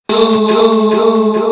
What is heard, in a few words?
Sms Tone; Dhoom 2